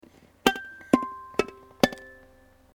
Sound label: harmonics, ukulele